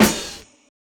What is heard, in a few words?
Snare